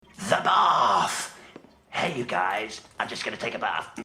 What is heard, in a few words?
the bath